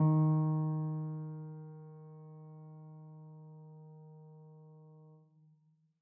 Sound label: piano sampler